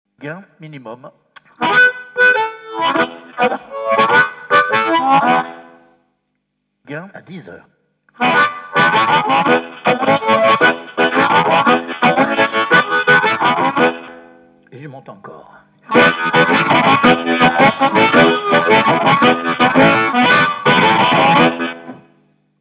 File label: Harmos; 0 Gains